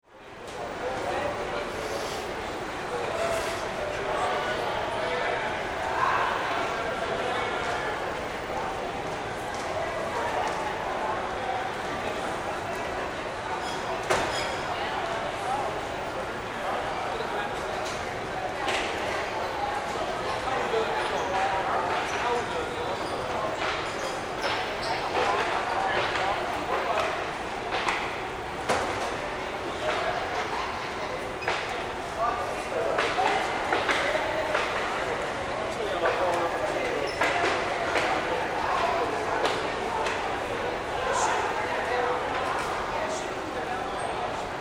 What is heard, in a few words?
Ambience Cafeteria; Cafeterias; Backgrounds